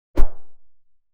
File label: short crisp anime punch i; martial arts